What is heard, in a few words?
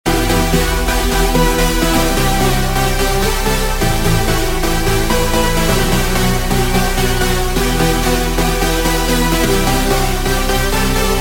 EDM; EDM Machine 1.3